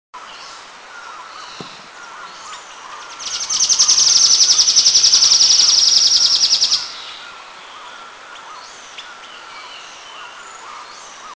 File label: Beautiful Nuthatch, Eaglenest, India